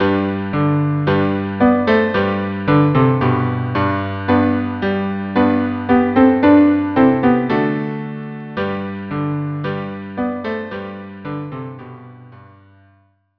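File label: march cello piano; king williams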